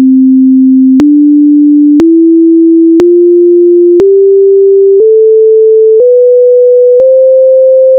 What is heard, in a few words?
piano